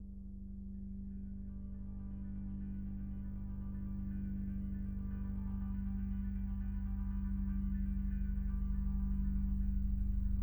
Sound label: Noise; volume alto